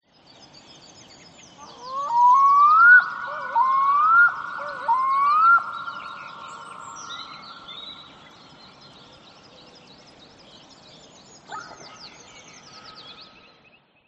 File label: Bird Black Throated Diver; audio hero